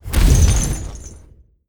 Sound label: settlement alert government attacked destroyed; empire game; horn alert